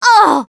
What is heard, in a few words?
hurt minor, russian, Survivor Voice Producer